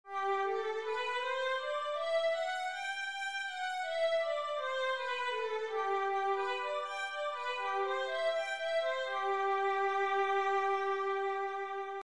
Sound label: violin g major, scales 1 octave